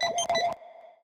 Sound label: scan